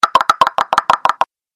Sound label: monster crab